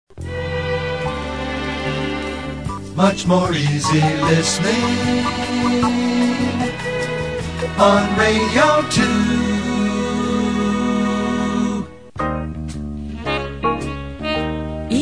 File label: jingle